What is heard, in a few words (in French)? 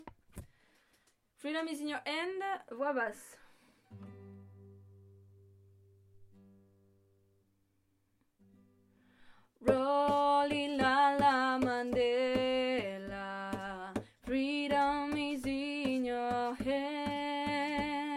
voix basse